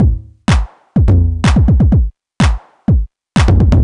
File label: drum loop tech stripped 125, Classic Chicago House; Sample Magic; Drum Loops